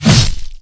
MOB BATTLE WOODSTICK, Battle Mob Weapon

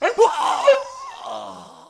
Mob die; Monster; 묘족; 낭아참마수

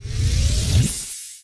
Blood Point in

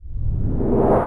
skill charge; SkillSound

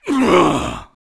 Mob die; Monster; 묘족; 참마수

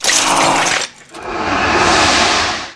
Mob die; Monster; 욱일승천류; 아야카시

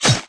MOB BATTLE AXE, Battle Mob Weapon

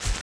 Ground Step Left, Movement

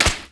leather bash wood

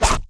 MOB BATTLE CLAW, Battle Mob Weapon

